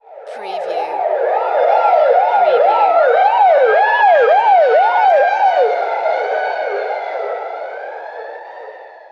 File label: Ambulance and Police Sirens Driveby